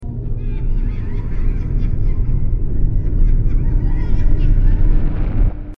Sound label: little people laughing